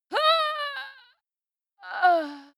female die; schreie; menschen

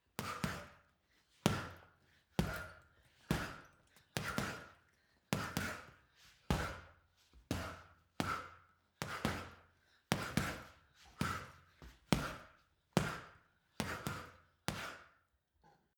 boxing punching bag boxing sequence female breath; sport-freizeit; boxen

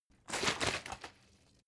bottle water sparse shake single; berufe

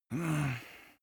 male sigh; menschen; koerpergeraeusche

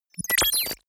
confirm bubble; maschinen; signale; soundpool

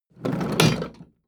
metal box roll smooth; soundpool

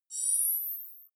navigation point

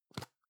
plastic can lid screw open medium; objekte; dose

